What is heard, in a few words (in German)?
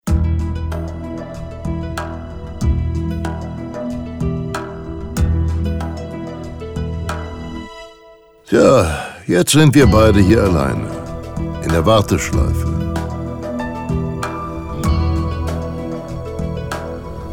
Schleife Stereo